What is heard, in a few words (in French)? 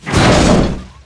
impact coque